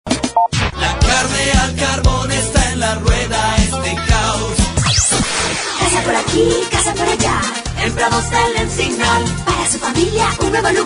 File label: Jingle; Jingle mix 2